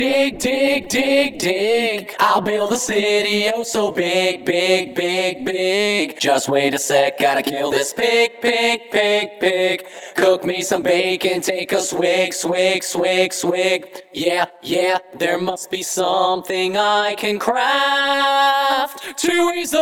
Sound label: Vocals